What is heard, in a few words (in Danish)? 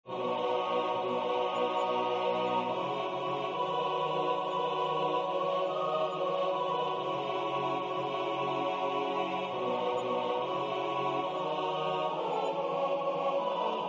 Yndigt dufter Danmark - SATB